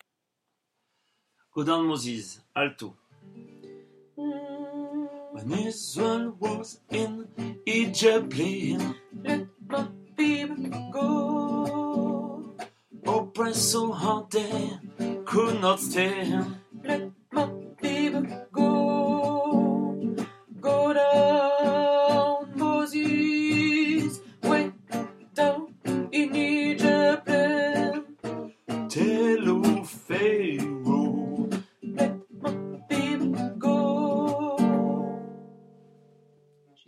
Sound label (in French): alto; go down moses